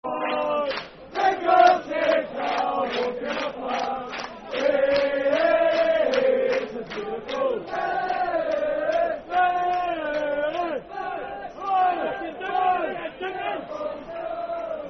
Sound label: Football Chant; Dundee United Dufc